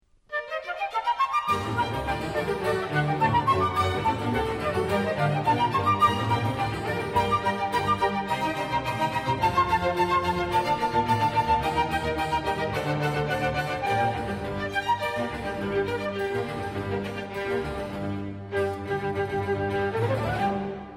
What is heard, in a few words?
Flute concerto; La Notte II. Fantasmi; Antonio Vivaldi; Klasika